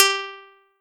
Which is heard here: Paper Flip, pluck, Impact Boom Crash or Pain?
pluck